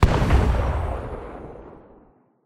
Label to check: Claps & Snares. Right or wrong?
Wrong